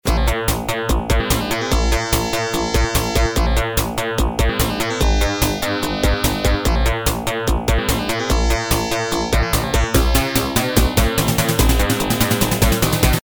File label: Stage 1-2; ZeroWing